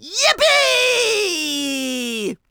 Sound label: fly vox